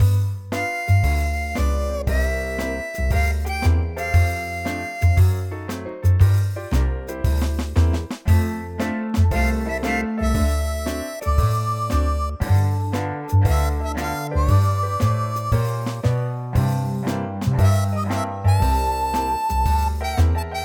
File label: Blues cello